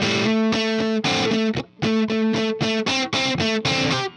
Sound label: Riff Guitar, Indie Rock; Sonic Foundry (Sony Creative Software); Indie Rock Anthems; Session 06 - Haze